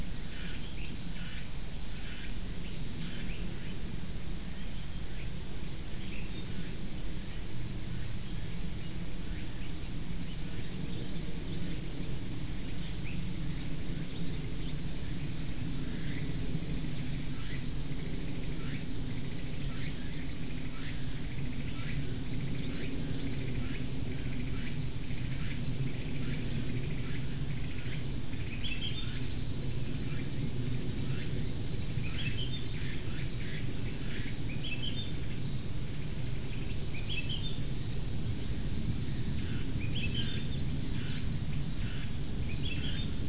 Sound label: mixture of bird and insect